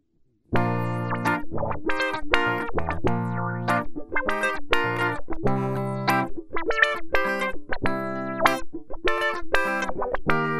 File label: Riff; Maplin AW